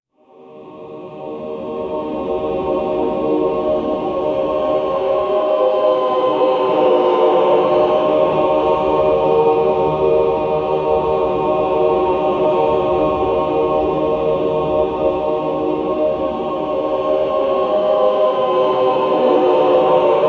creepy deep mystical myst, cosmic

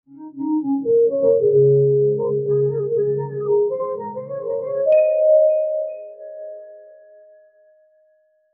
the soundscape must fuse, javanese

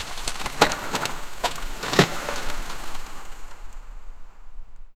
sound of driving piles, heavy machinery

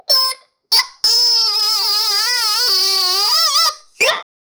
comical pfft pfft foam sp, funny

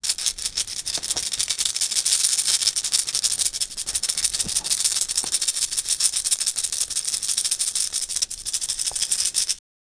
maracas, shaker

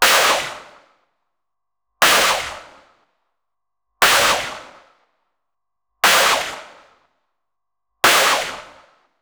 weapons

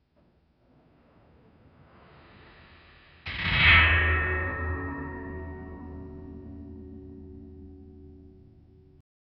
slow motion metal chain

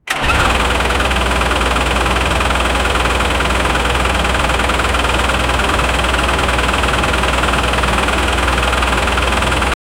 diesel engine and gears; take off

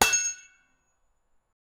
large heavy metal hammer, percussive

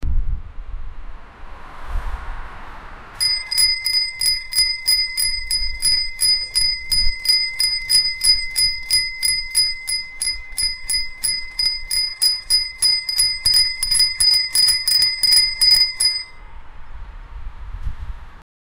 a bike on the street, bicycle

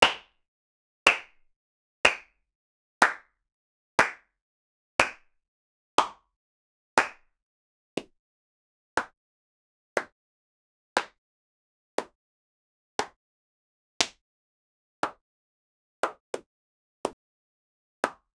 flamenco clap percussian

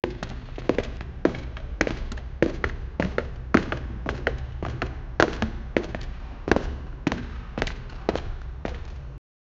footsteps on the roof; platformer

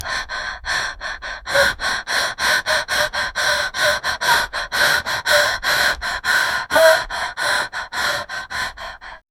exhausted deep female breath

